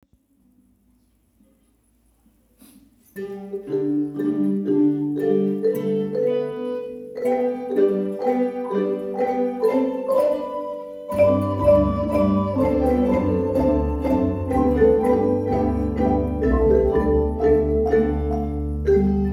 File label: Fanfare